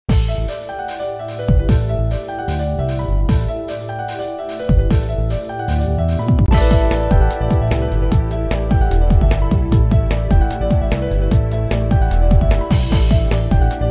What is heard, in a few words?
silent battle